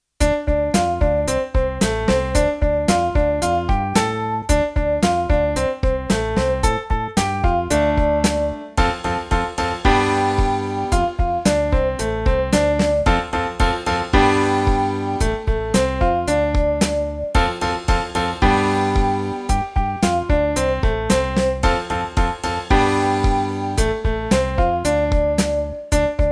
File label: Power Riffs